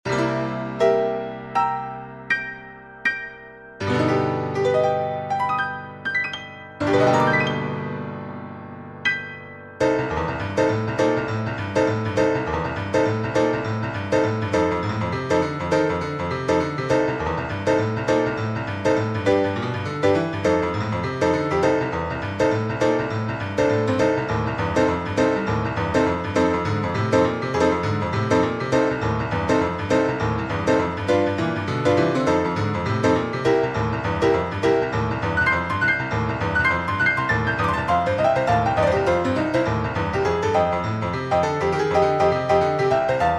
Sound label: Boogie Woogie Piano At Heathrow Airport